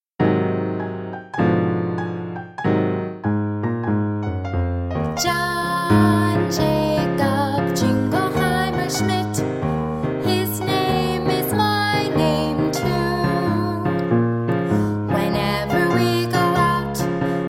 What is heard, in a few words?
john jacob jingleheimer schmidt vocal, Children's Music; john jacob jingleheimer schmidt song